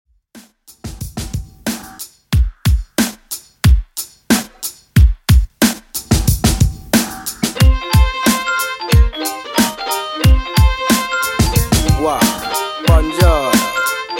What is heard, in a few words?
reggae redrum 91 bpm